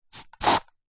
household deodorant spray

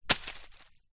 impact rock small land amongst leaves bushes

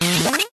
science fiction computer; Audio Mangler

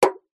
foley drink can cola impact liquid inside

